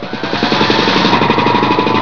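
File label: compressor; MACHINES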